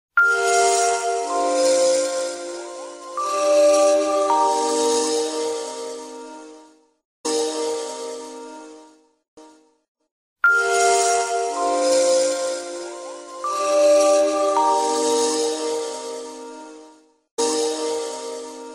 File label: nokia ringtone; titanics